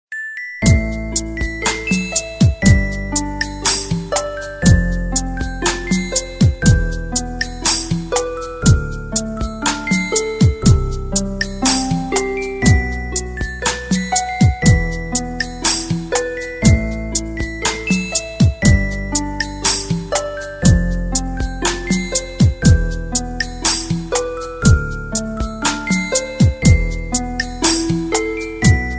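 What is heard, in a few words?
background music; the little match girl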